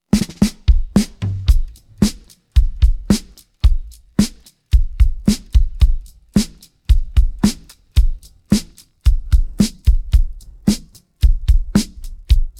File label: pattern, drums